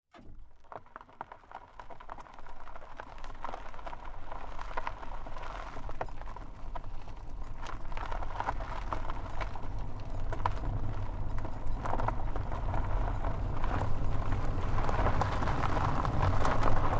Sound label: Car Tire Onboard Gravel Dirt Roll Slow Long; Cars; Big Room Sound